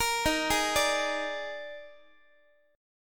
Eb Major 7th Suspended 4th, Guitar; EbM7sus4 arpeggiated

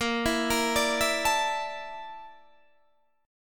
A sharp Major 7th Flat 5th, Guitar, DADGAD

